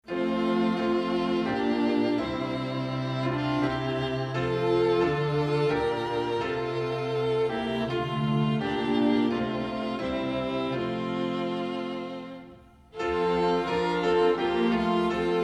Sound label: god save the king words and music